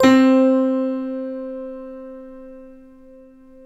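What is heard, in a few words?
six pianos, Distant Stack; Optical Media International; Sonic Images Library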